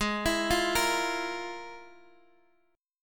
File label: F Minor Major 11th